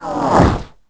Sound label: roar